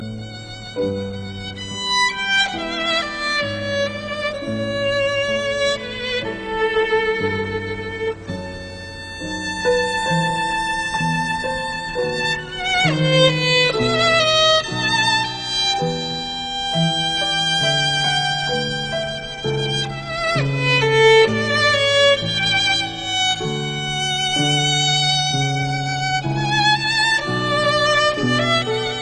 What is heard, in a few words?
violin and piano